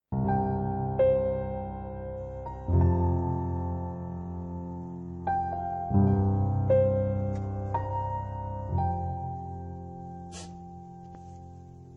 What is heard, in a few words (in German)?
Vorspannmusik; lampe2020